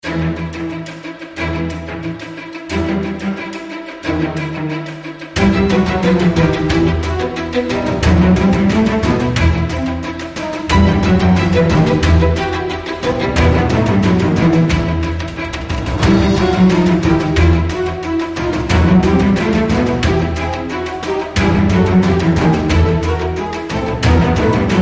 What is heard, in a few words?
Adrenaline Explosion